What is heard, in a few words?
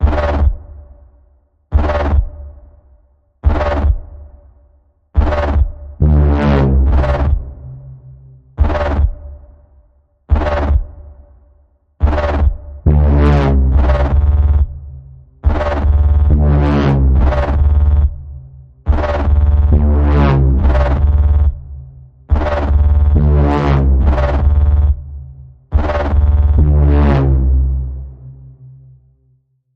Evil Warping Bass Pulse D, Key of D